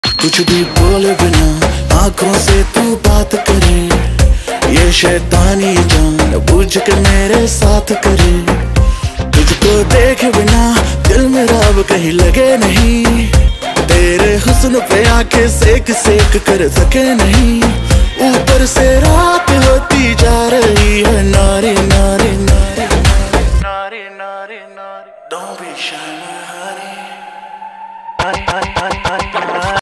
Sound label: hindi rap ringtone